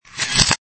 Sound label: camera, reverse